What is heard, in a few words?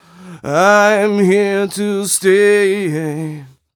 m 127 mel a, Vocals